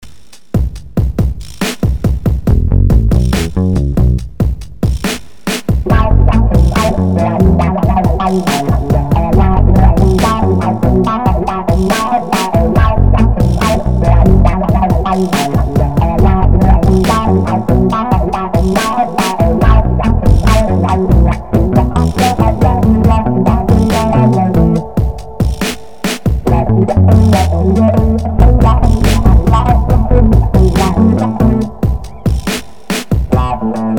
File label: Funky Funk Arena and Stadium Chill; Puckett Productions